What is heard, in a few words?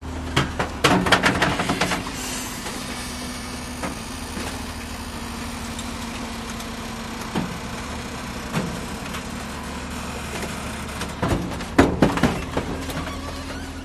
excavator loading debris into a dump truck clanks close perspective; sound ex machina